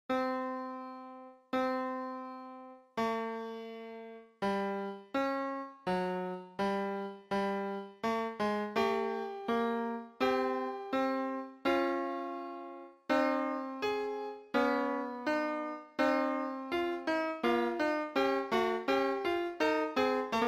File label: 26 tone fugue; Xenharmonic Alliance